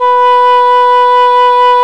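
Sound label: B5 ENGLISH HORN; ORCH.COMP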